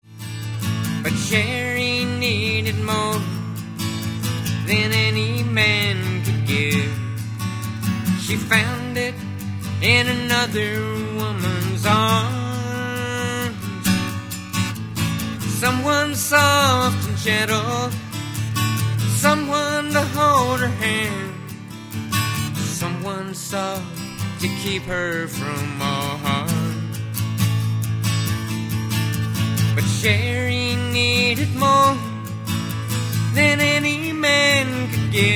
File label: Acoustic Track